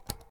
Fan button; Phasmophobia

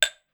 glass; Phasmophobia

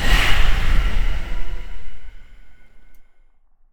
Summoning Circle Poof; Phasmophobia

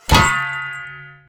beartrap close; Phasmophobia

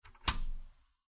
Tv On; Phasmophobia

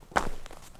FOOTSTEP Trainers Snow Compact Walk Slow

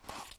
Voodoo Pin; Phasmophobia

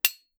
metal small; Phasmophobia

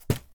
Throwing; Phasmophobia

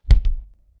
Morgue door close; Phasmophobia

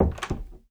DOOR Indoor Wood Close Gentle stereo; Phasmophobia

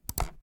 Mouse click; Phasmophobia

